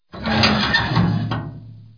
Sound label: statue arm; Heretic II